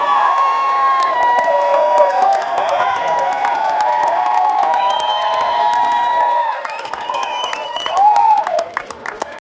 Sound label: club ambient noise of peo